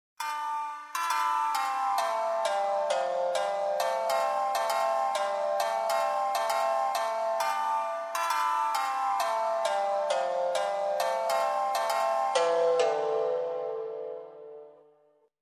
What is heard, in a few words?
Shamisen